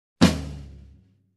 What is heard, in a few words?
Snare and Tom simultaneous hit